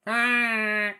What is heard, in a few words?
Fark bird; SyrinScape; Syrinscape unplugged; Jungleplanet dinolaser battle